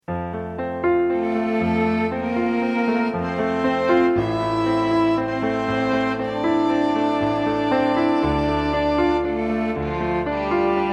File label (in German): supersweet trio